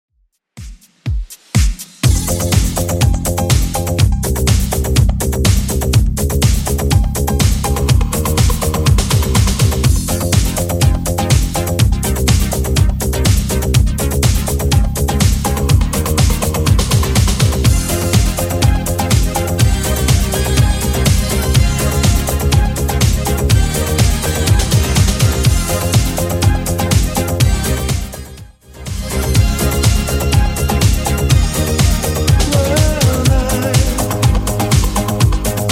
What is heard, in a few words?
80s Redrum 123bpm